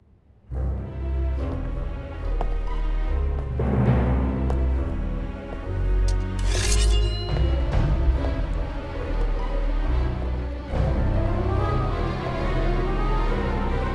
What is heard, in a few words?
persian trap